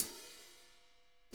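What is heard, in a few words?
hats open; Sonic Reality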